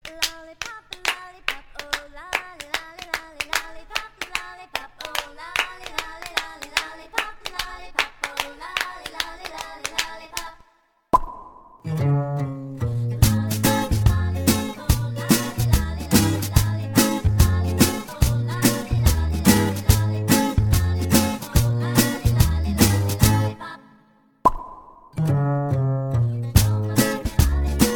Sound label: Lillipop Instrumental